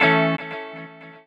guitar stab

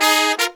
Horns, 110BPM; Zero-G; Phantom Horns

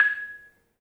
MARM, 3D MARIMBA; 3D Sound; 3D Keys VOL-1

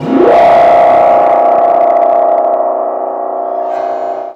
Strange Sound; Sonic Foundry (Sony Creative Software) - Stylus Pressure Urban Grooves on Digital Wax; Chase Scene

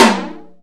FLANGE TOM; Greytsounds VOL-1; SDX TOMS

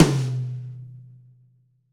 Rock Tom 50, Roland BK-5 Toms

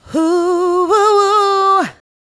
Whoohoho, Vocal Phrases; Ultimate Vocals

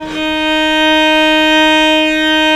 VL D#3, VIOLE, Historical Instruments; USB Soundscan vol.23

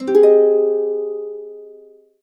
HC AB MAJ, Harps; USB Soundscan vol.62; Complete Harps; AKAI

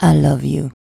VOX VOX; Sound & Vision - Gigapack II CD 2

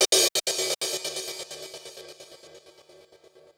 Gated Cymbal; musicradar

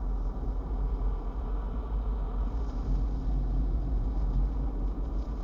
Ambience Loop, outdoor

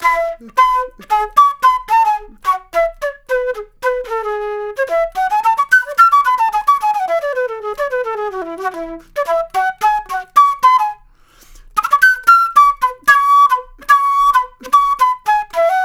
Flute Solo, Jazz

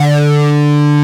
OBERHEIMS

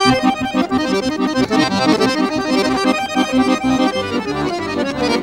ACC LINE, MELODIC; Best Service; World Colours

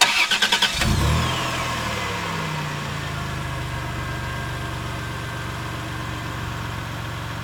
ENGINE Start, Car; VSI Car

